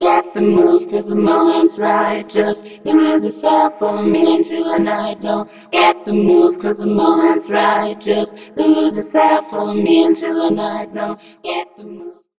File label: Orange Vocoder Voicing Unison; Zynaptiq